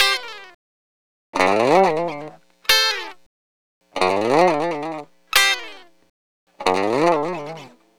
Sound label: Lounge Gtr-C Dry; Zero-G Kocktail Kollection; Construction Kitz (Lounge); Welk Lounge 090